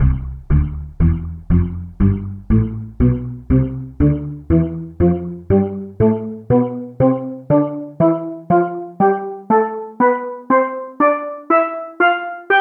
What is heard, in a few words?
steel drums tonleiter